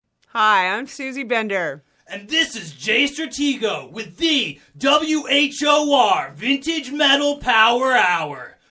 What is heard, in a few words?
Phase Example